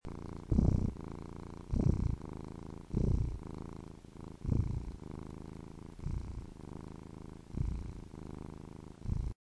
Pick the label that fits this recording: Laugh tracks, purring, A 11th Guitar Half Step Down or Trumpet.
purring